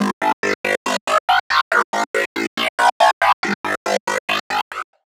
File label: Lead, Dubstep and Trap